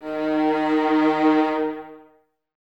String Ensembles, Violas; D#; Miroslav Vitous; LINKS ORCH

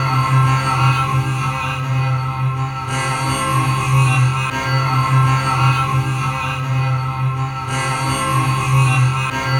Chime Guitar, Strings; Sonic Foundry (Sony Creative Software); Myths of Technology

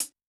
Close, HiHat, Yamaha DTXpress-II

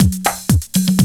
120, LOOP, Roland; Sound & Vision - Gigapack I

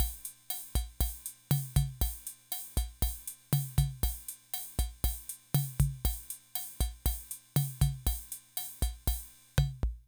Loop, rhythm 55, classic machines

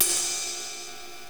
ride cym, Jazz Drum Kit; Giga Samples Collection

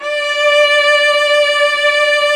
ARCO CELLI; OMI - Universe of Sounds

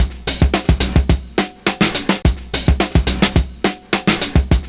PICCOLO SNR; Univers Sons

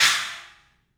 3D Percussives; E-MU Producer Series Vol. 5 – 3-D Audio Collection; P.A. Kit

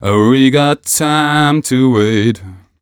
m 127 mel a, Vocals

